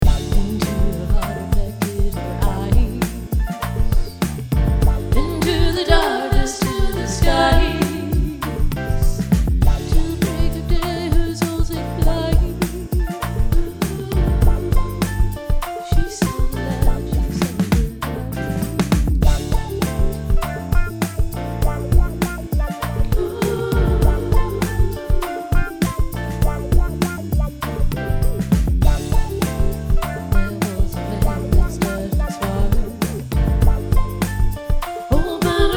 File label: dual gtr